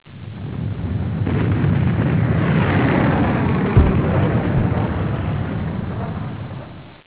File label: Fly By; combatfs